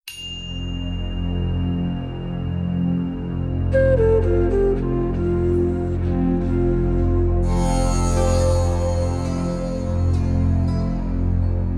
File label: Meditation Music